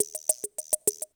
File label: CR78 perc; 90 sSampleCDs; Crypton - DrumBase5000